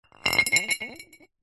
glass bottle 1 ltr set down on side wobble tiled floor